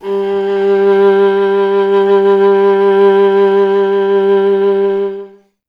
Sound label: viola G0, Solo Instruments; Miroslav Vitous; Viola 1